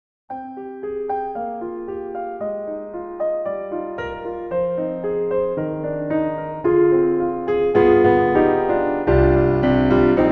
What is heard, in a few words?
Piano Classical; Cinesamples; CinePiano